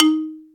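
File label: xylophone D#3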